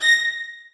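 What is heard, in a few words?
2nd violins staccato a#3; Sonatina Symphonic Orchestra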